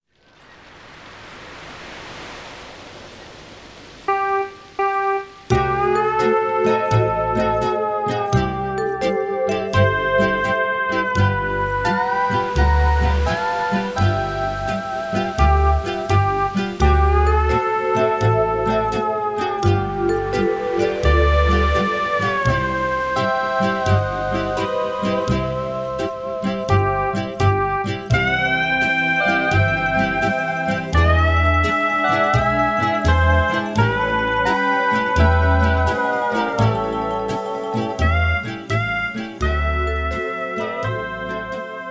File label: Hawaiian